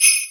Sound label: heartbeat; Rin Skin 2.0